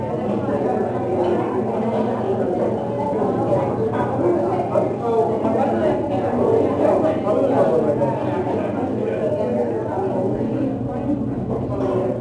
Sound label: Crowd Amb; Levels Hub Diner; Dress Tiana; Cake Deco Crowd Amb